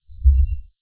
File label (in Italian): battito cardiaco; Castello Monselice